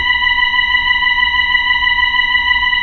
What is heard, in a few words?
B-3 Chorus